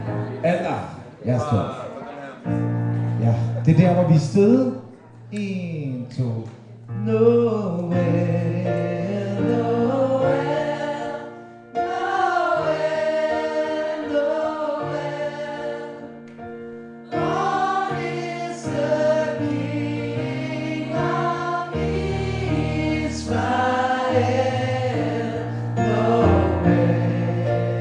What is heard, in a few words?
noel alt